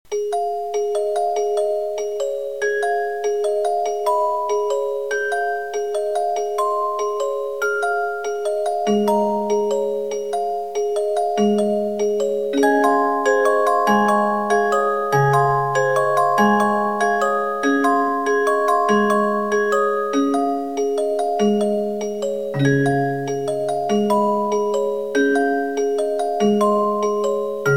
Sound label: childrens song 3part